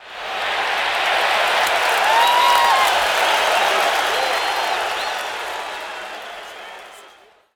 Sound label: BMX crowd cheer small; Crowds